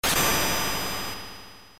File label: retort; ff3; ff3us